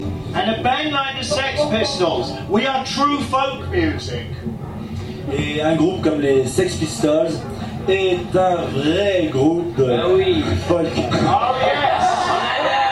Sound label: Pure folk music; »Alpenmusik«; Festival der Elbphilharmonie Konzerte